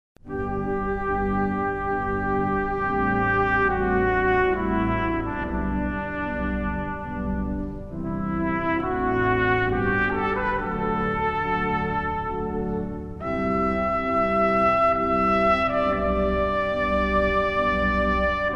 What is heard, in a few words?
Largo in G TPT; wedding